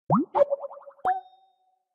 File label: file transfer completed; skype for linux